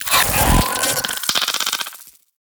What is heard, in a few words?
Sentient Mimic Unfold; Sentient Trooper